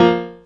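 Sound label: piano dit, Stabs; E Lab; XTc Files of House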